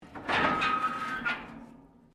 METAL RUSTY SCRAPE

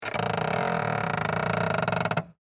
Creak Plastic, Texture, Creak, Plastic; Creaking; Objects; BlastWave

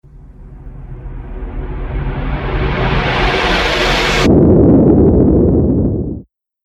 Logo Intro Sound Effect; Sonic Logo Sound Effects

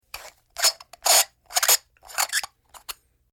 Canister Foley Squeaky Open Close; Squeaks (Sound Effects:Objects)

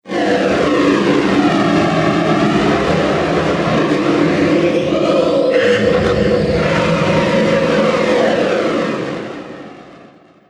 Old School Arcade Firey Sounds, video game; Andrew Fleming

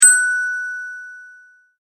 multimedia tone correct

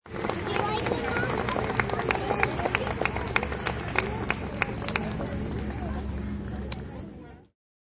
Medium sized crowd clapping; Crowd Applause Outside; Applause (Sound Effects:Human)

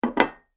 Large Dinner Plate Placed On Table; Dishware Sound Effects; Household Sound Effect; Andrew Fleming

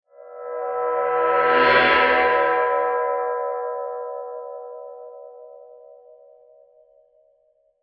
Metal Transition With Swoosh; Scary Soundscapes; Horror; Crafted Signals

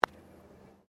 Golf Putt; Sports

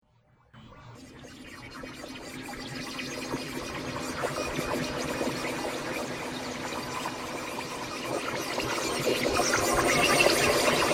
Alien Radio Interference; Alien Sound Effects

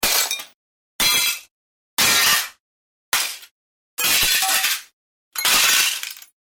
Glass Breaks, Smashing glass x 6; Smashes; Objects

